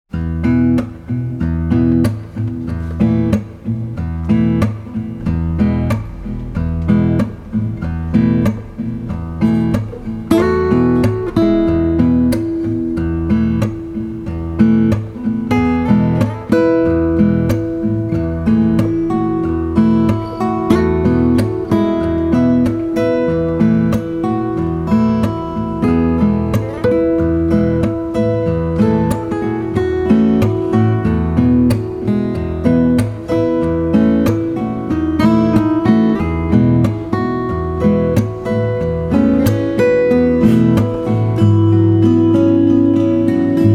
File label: chilling in e major